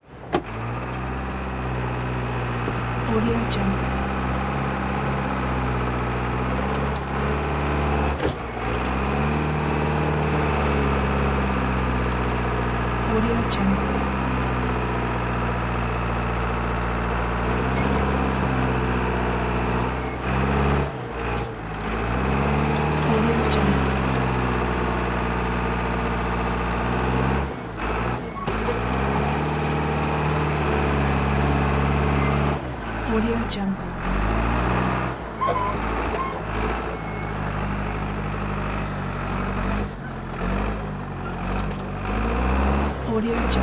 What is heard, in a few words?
bulldozer international 100e; prosoundfx